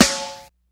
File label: Snare Ringy Hi Mid Fi, Lounge Single Drum Hitz